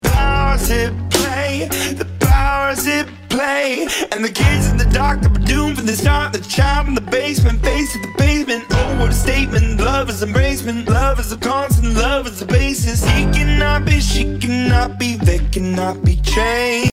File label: Slow Rap